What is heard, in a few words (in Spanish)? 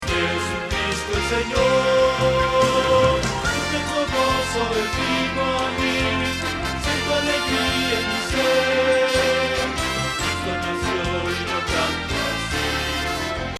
Bajo